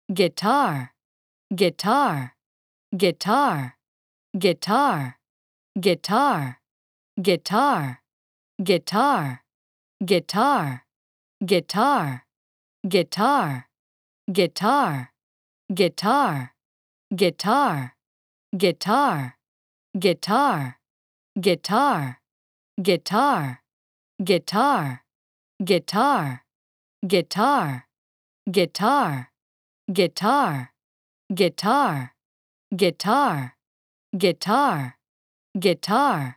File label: guitar